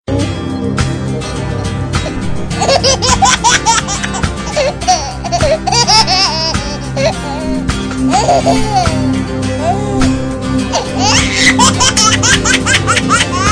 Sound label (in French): Rire Bb